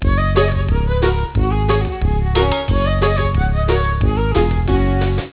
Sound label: cajon